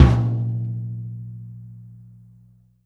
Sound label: TOM, 180 BPM; Ueberschall; Drum & Bass Flash Trackz